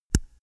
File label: microphone pop off; objekte